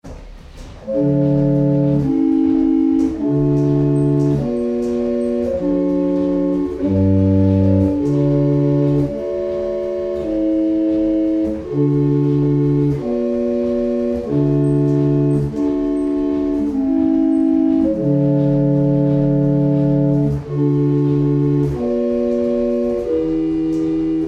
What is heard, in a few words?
barrel organs; Boxford; London New